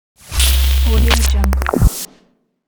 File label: glitch intro